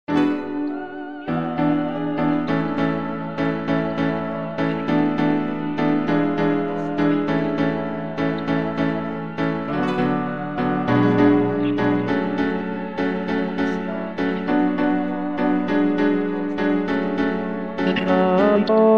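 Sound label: Tenor 1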